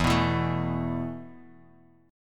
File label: E Suspended 4th, Esus4 chord; Esus4 strummed; 12,14,14,14